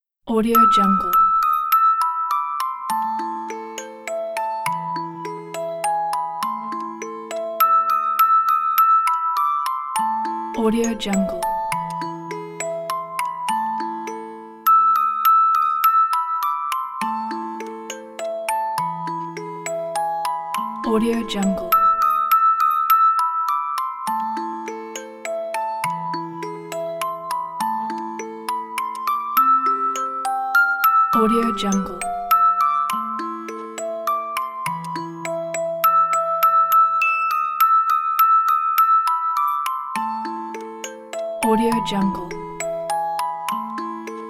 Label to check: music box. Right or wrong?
Right